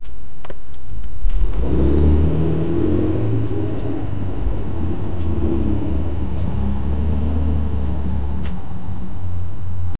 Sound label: the sound of a shot, exhaust